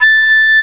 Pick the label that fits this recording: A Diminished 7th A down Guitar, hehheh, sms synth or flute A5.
flute A5